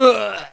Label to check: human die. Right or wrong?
Right